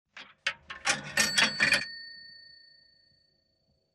opuskanie monet v telefonnyj apparat

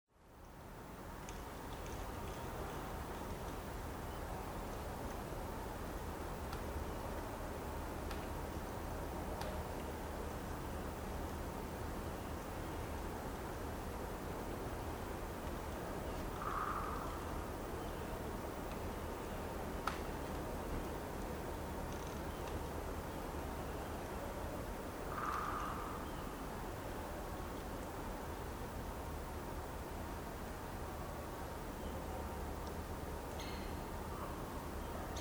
tihij den v gorodskom parke; Звуки города

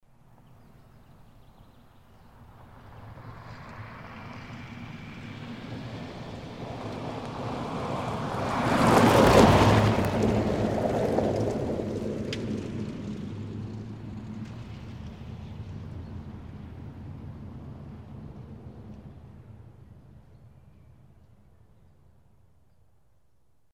variant blizko mimo

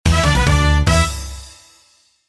final istorii orkestr

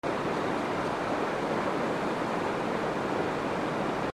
Zvuk vetra na otkrytom vozduhe

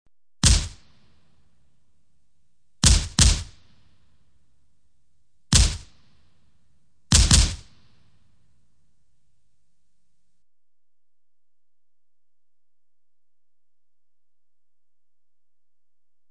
Avtomatnye ocheredi

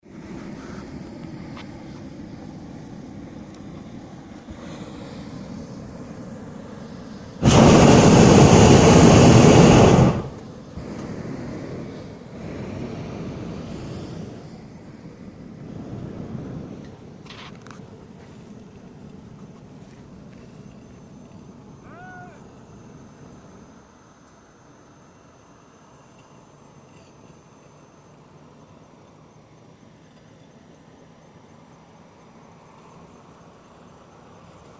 zvuk shara v vozduhe

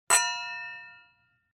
hrustalnye shampanskoe

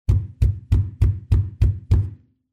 massivnaja dver

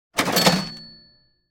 staraja kassa

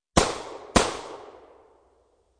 Pistolet 38 kal vystrel na ulice

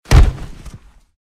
padenie na betonnyj pol; Звуки падения человека

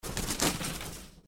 vzlet vorony

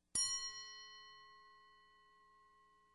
Kolokolchik korabelny; Колокольчик корабельный; Любой звук колокольчика, корабельного, музыкального, маленького, большого, одиночные удары

